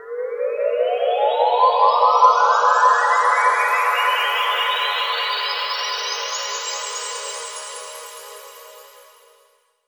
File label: RISING SYNTH WAVE, SLOW SYNTHESIZER WAVES